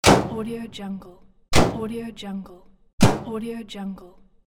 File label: door auto